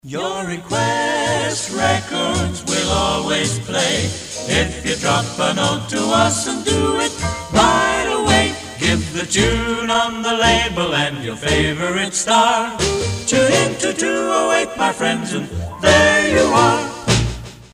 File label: jingle